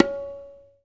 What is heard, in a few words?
TAH, TABLAS, PERCUSSION; AKAI S6000 CD-ROM - Volume 1